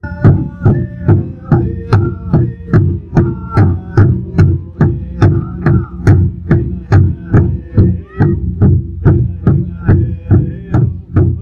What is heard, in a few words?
19th Century Period Music Indian Drums